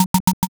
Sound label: locked door